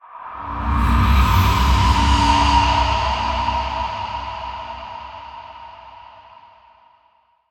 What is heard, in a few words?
Intro Transition End Stinger; Chimera Ballas Intro; Warframe; Cinematics